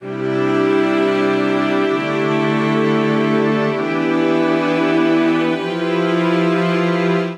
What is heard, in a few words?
Progression C Major 130 bpm, string quartet; Imaj9-iisus4-iiimin7-Fmaj9#11; MIDI Clips; Butterflies; Ableton; Factory Packs; Spitfire Audio